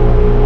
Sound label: electric, engines; stunt rally